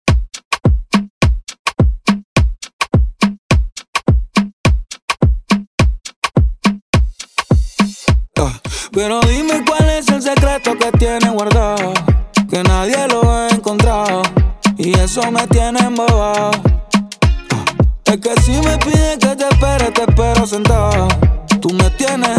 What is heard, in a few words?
remix bpm 105; tech house & house music